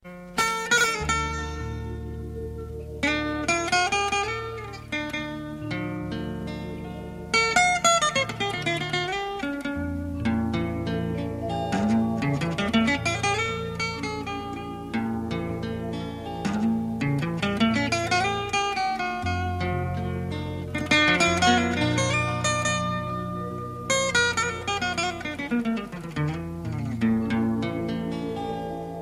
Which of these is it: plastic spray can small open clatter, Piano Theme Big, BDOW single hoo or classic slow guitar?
classic slow guitar